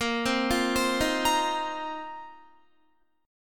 Bb Suspended 2nd Suspended 4th, Guitar; Bbsus2sus4 arpeggiated

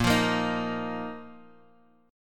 Bb Suspended 4th, Guitar; Bbsus4 strummed; 6,8,8,8,x,x; JGuitar

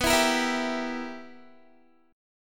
B Major 7th Suspended 4th Sharp 5th B down Guitar; BM7sus4#5; strummed; 4E,4A,5D,5G,5C,6F

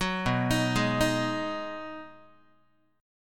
B 6th B down Guitar 4A 4E 5A 5D 5G sharp 6C sharp; arpeggiated

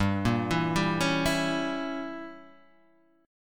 E Diminished 7th G, guitar

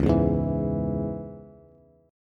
Db Minor Db down Bass; strummed

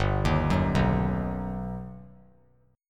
G Augmented Major 7th, chord; G+M7 arpeggiated; JGuitar

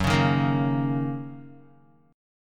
Fsharp 6th down Guitar; strummed; JGuitar

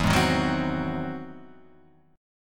E Augmented Major 9th, Guitar, Half Step Down

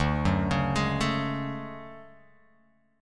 D Major Sharp 11th D Guitar Open F, chord; arpeggiated; JGuitar

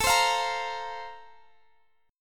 F Suspended 4th A; Fsus/A; strummed

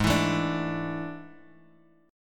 A Major 7th Suspended 4th G sharp

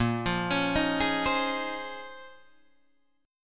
Bb Minor 11th Bb down Guitar DADGAD

